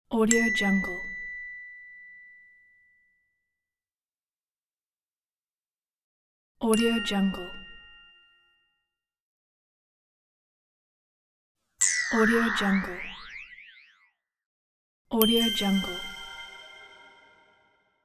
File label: digital bell